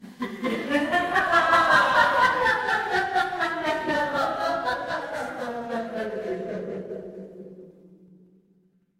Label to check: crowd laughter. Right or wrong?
Right